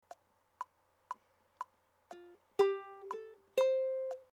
accent, ukulele

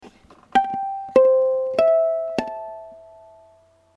harmonics, ukulele

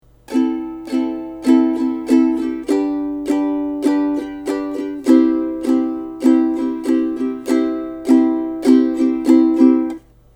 Chorus Strum, ukulele

